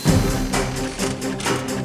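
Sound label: mountain world music cage forcefield; rayman origins 2011 gamerip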